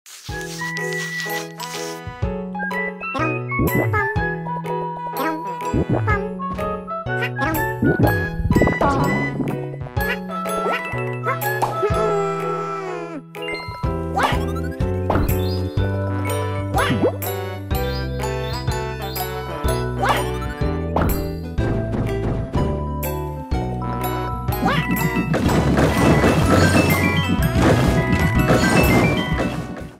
E3 Trailer Theme; Yoshi's New Island

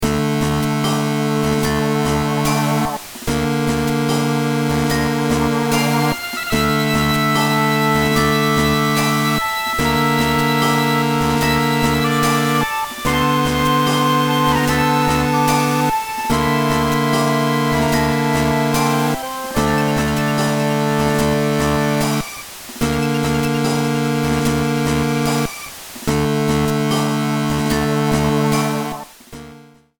Bomb Quest Accepted; Shroom City

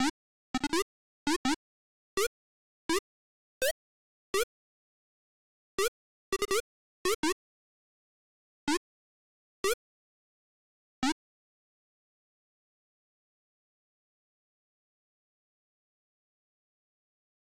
Explosion Wobble Theme; Mario Paint

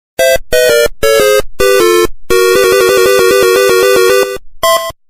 Game Over; Fire & Octopus

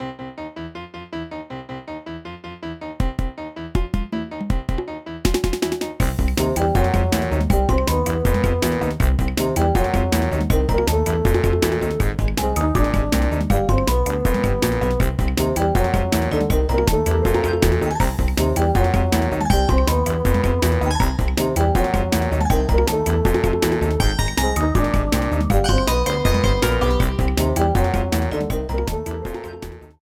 BOSS BATTLE MID

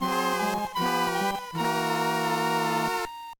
Game Over; Shroom City

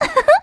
Attack sound effect; PMTTYD

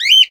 Swoop; Mario Kart Wii

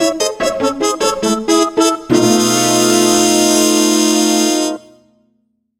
Failed

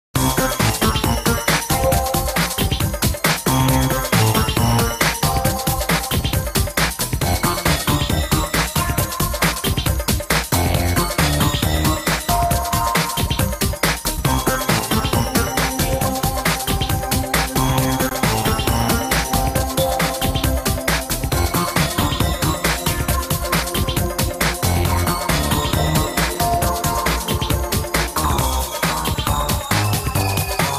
Underground Theme Coin Rush; SMR

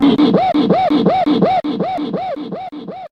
yelling

